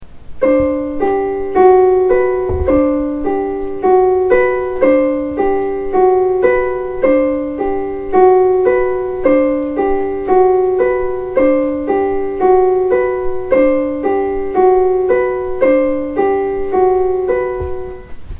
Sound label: Ostinato; Clarity